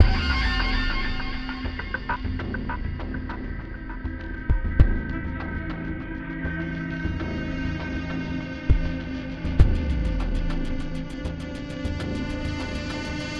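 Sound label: music, synth guitar loop; transformers war for cybertron gamerip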